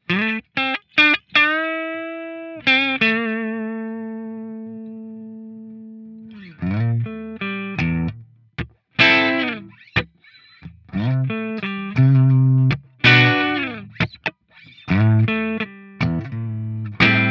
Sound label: Rhythm